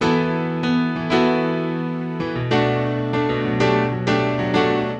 Synt

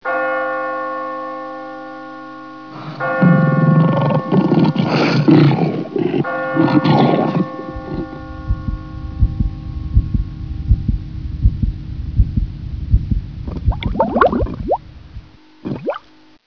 RADIO

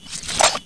knife; Soldat

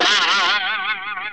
Twang; worms2

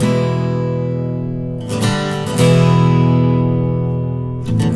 Strummed, Acoustic Guitars; ACID MUSIC

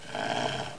SNARL; DINK

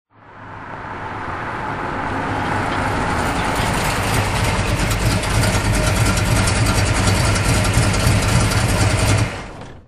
drive up

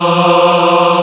choir aahs F3; Star Trek JR Audio

